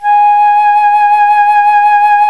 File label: FLT FLUTE, Flt vib; Roland L-CDX-03 Disk 1